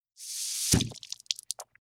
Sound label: splash